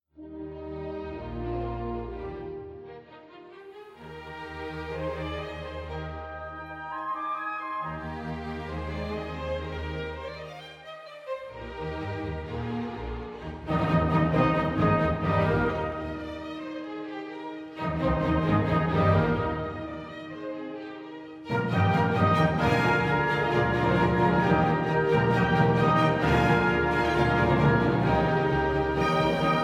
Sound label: Trumpet Concerto in E flat Major, Allegro